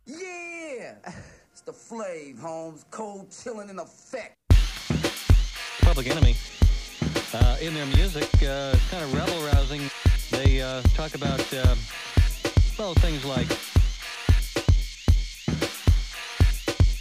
Instrumental

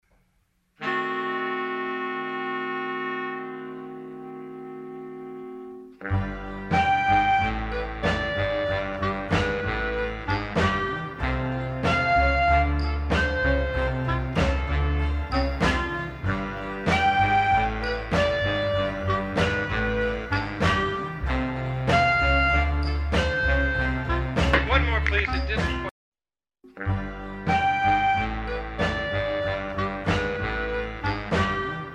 Wind Chimes

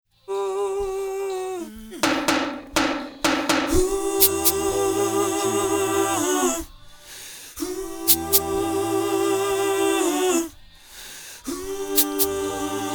Percussion Overdubs

